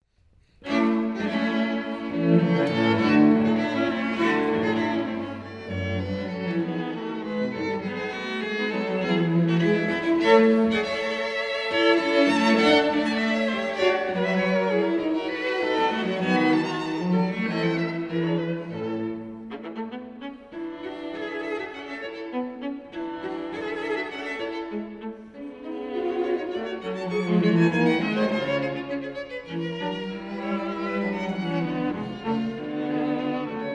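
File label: string trio; fuchs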